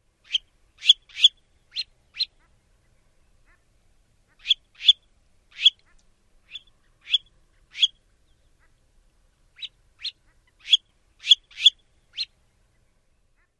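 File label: REDPHA zweet calls, red phalarope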